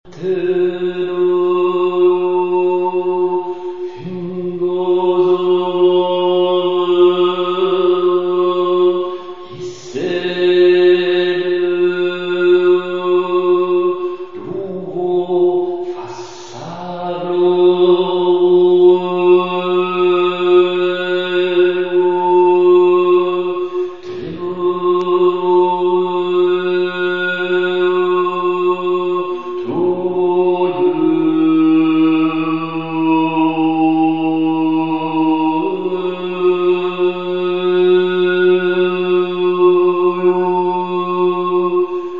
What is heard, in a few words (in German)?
Oberton, Didgeridoo